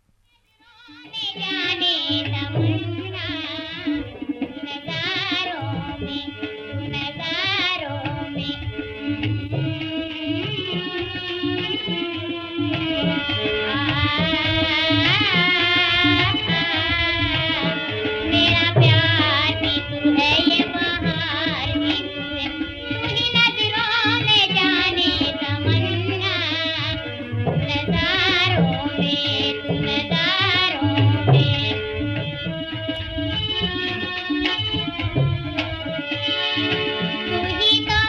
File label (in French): Chant de femmes